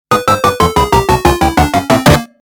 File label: lose